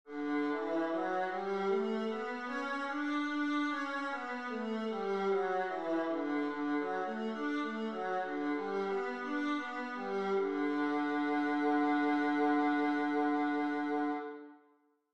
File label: viola D major; scales